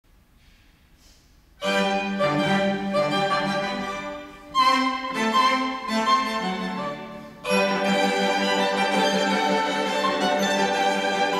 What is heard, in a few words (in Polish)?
KWARTET; W. A. Mozart; Eine kleine Nachtmusic